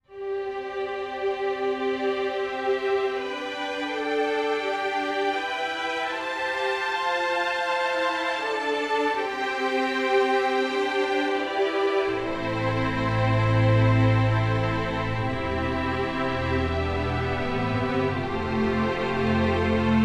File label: Full Strings